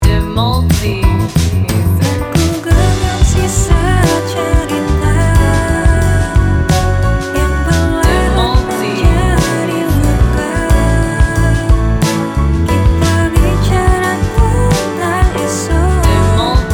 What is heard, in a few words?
pop